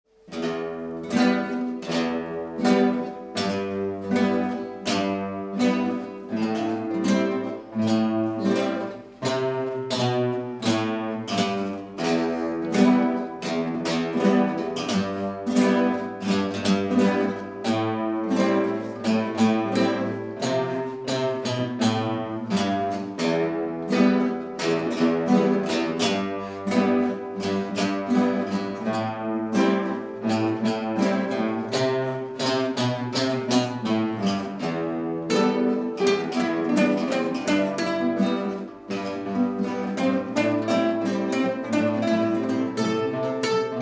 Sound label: guitar groove